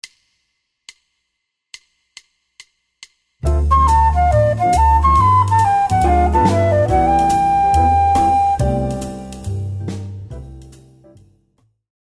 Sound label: bebop scale